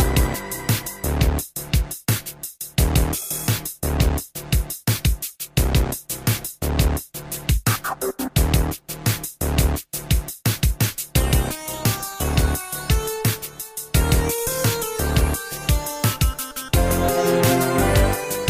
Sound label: Castle Inside; StormatuaryCastle; smbx38a; The Great SMBX 38A Contest II